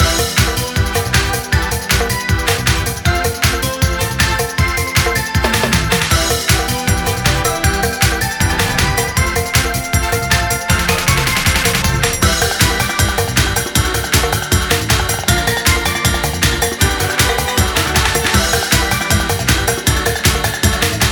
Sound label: Forest Battle